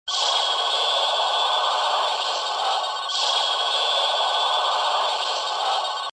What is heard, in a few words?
Alarm Super Beep